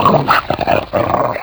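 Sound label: cerberus battle; sf effects; sinfar haks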